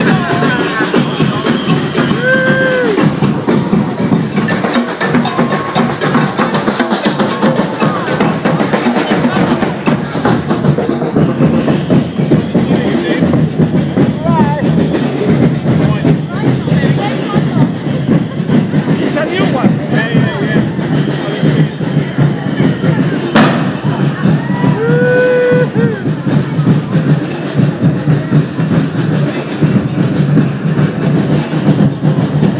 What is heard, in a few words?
bonfire drums